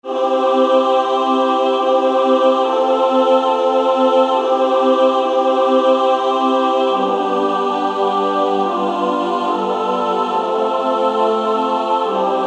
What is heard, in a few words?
Female Choir SSAA; Low In The Grave He Lay